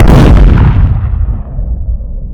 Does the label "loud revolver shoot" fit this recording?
Yes